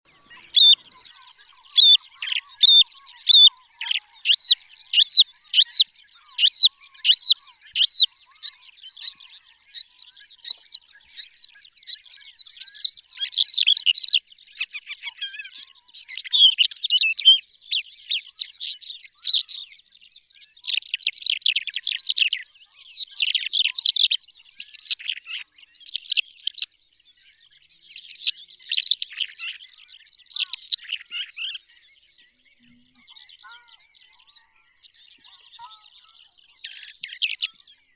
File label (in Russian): zhavoronok solonchakovii calandrella cheleensis, zvuki prirodi